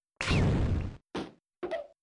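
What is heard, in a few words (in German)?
Schildkröte Tod; jazz jackrabbit 2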